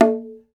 bongo, Roland; Club-50 - Foundations Roland; Hybrid Kits; Thin 2

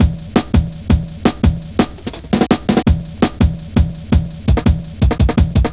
Tha Size, Drum Loops, Jungle, 167.5

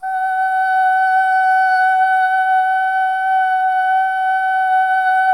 SOLO BOY, OH; Spectrasonics; Symphony of Voices

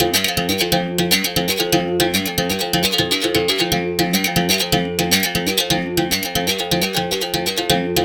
120 Berim. Samba; Spectrasonics - Supreme Beats - World Dance

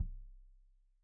Kik Stereo; Deeply Sampled Drums; SMDrum

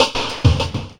Wah Bumpers; Sonic Foundry (Sony Creative Software); Esoterik Beatz; Wah Fx

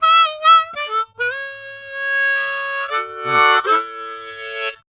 Harm 098bpm A, Harmonica

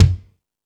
Lazer Drums, drum machine; E-MU Pro-Cussion-1

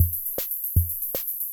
Loop, classic machines, rhythm77

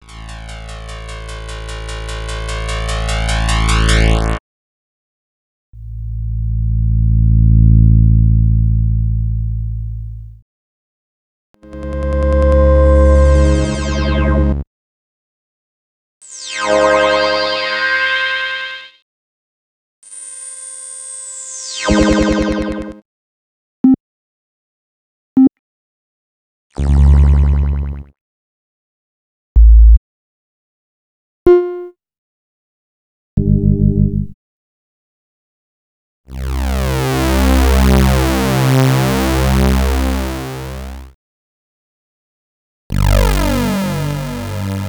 Pad Bass&Tone; X-Static Goldmine 5

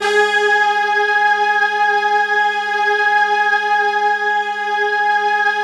sky choir, Deep Sky; Optical Media International; Sonic Images Library

